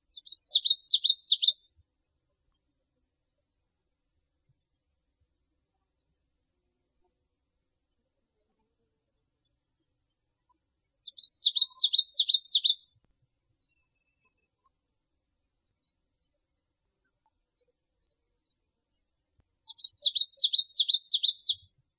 Geothlypis nelsoni

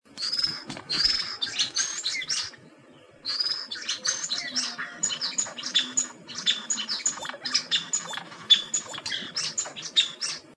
Campylopterus curvipennis

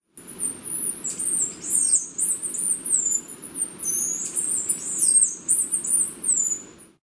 Buarremon brunneinuchus

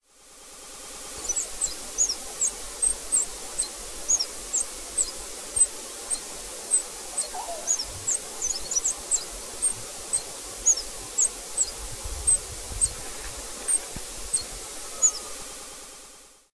Phaethornis longuemareus

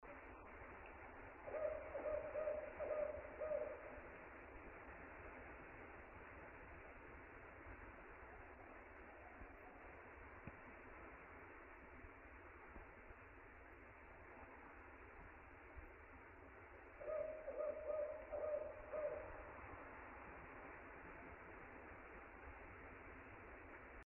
Strix fulvescens; S. M. Papalo

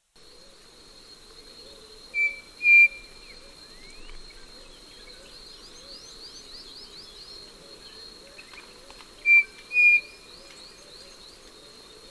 Tapera naevia

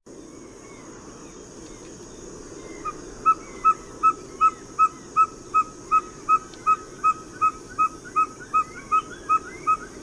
Glaucidium brasilianum

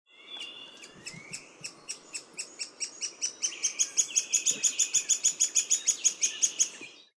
Anabacerthia variegaticeps

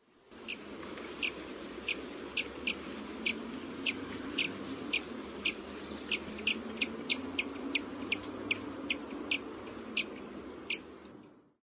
Amazilia beryllina

